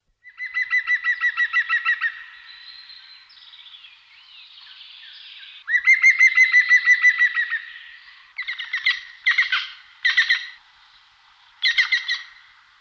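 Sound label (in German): Grünspecht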